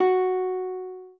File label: spanish guitar